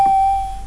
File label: ting; kingarth